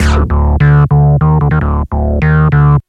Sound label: RAVE; Rubber Chicken Software Sample Bank; RAVE CITY